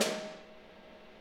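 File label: Snare Hit Loop; Digital Sound Factory Virtuoso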